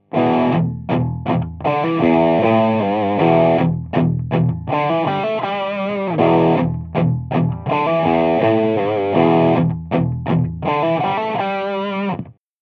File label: picking